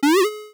searching; Original TK Sounds

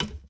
steel step; Golden Crayon Sounds

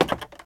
wood step; Golden Crayon Sounds

